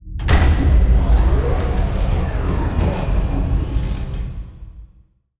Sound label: dyson sphere build upgrade; Stellaris; utopia